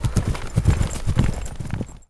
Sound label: Horse Loop; warcraft3; knight